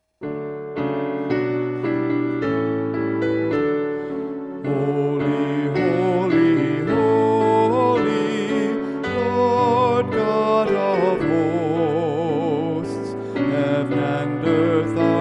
Heritage Holy melody

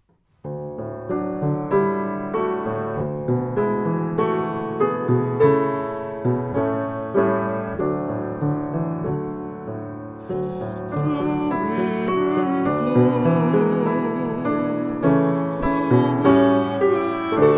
2 part harmony, hymns, choir music; Flow River Flow

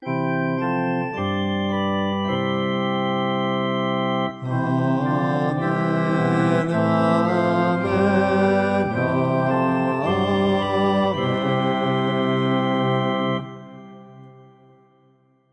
Heritage Amen B

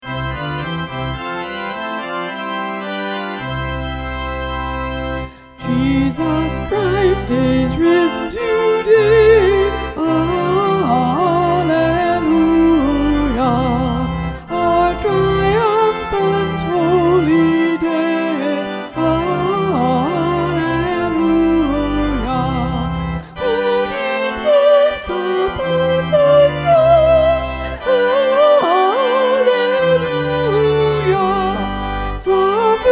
Jesus Christ Is Risen Today soprano

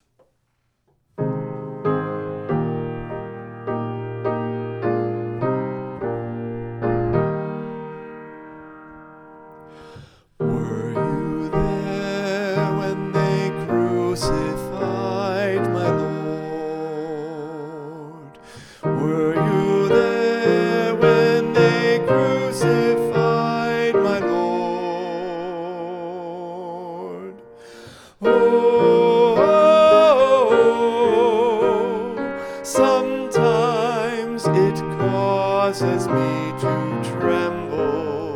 Were You There SATB S

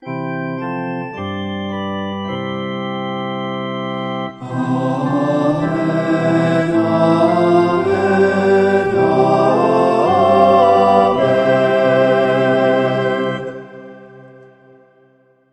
Heritage Amen mix

